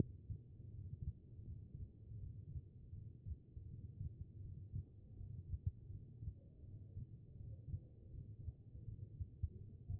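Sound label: HEART, heart sound; B MODE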